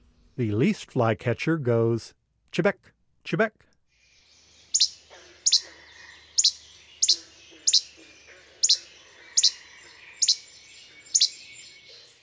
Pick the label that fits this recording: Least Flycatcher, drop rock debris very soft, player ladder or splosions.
Least Flycatcher